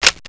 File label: MP40 Clip out, weapons; dod